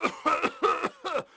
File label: cough; Pirates Of The Caribbean; AMBIENT